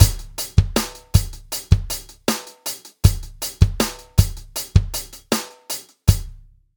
shuffle example

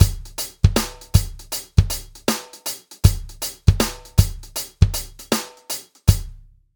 shuffle example

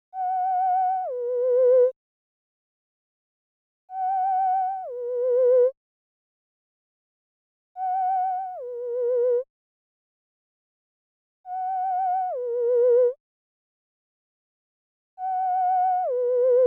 example of note transition for the theremin; transition between note 2 and 3

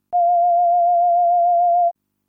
sine-tone with fast pitch vibrato (12 Hz)

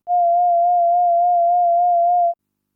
sine-tone with slow pitch vibrato (4 Hz); Rhythmic aspects of vibrato; Peter Desain, Henkjan Honing, Rinus Aarts and Renee Timmers

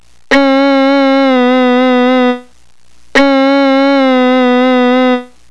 portamentos Va and Vb

